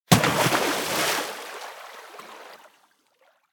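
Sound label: splash large; smite; poseidon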